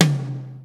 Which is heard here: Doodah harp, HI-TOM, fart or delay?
HI-TOM